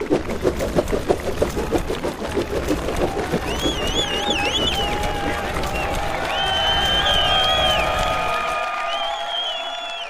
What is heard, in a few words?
multiplier spin; Racetrack Riches; megaboard